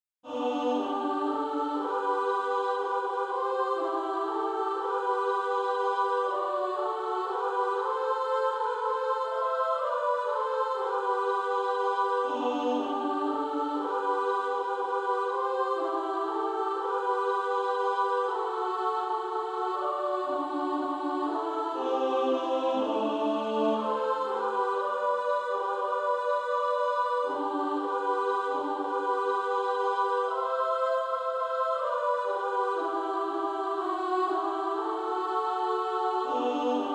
Female Choir

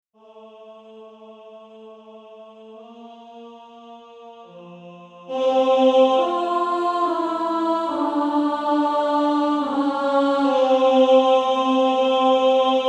Male Choir

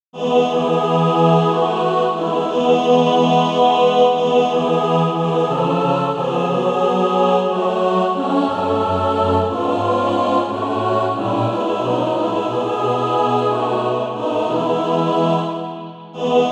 mixed

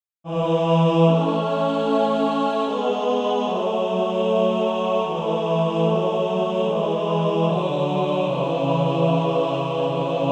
Male Choir; Christ Liveth in Me

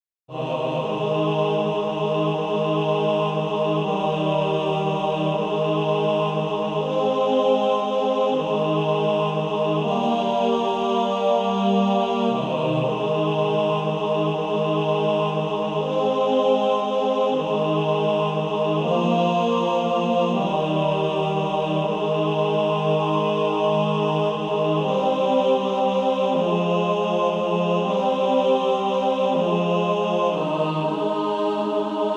Male Choir

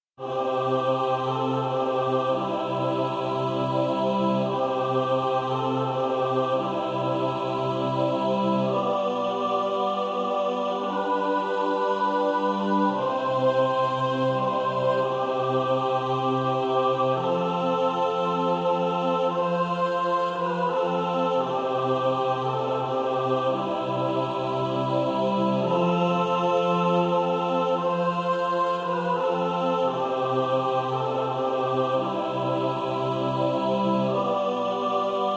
silent night mixed

